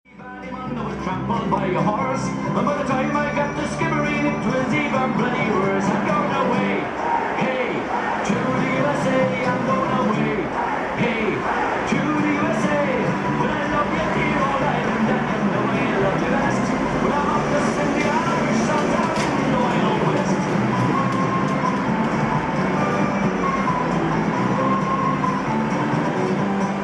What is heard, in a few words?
sat outside the bar digbeth; connaught